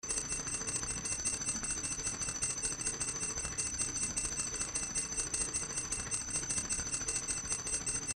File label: electronic clockwork noise